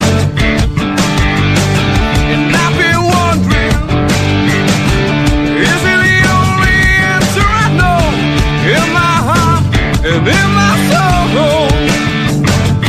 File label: rock n roll ringtone